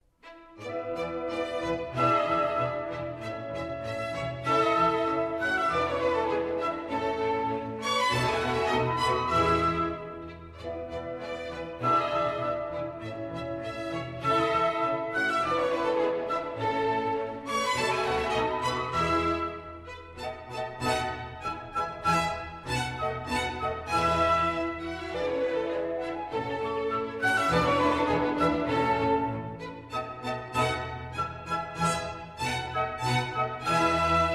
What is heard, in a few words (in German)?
Contretänze für orchester; Tanz II